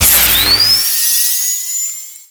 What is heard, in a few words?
Mine Activate; Warframe; Veilbreaker; Sprag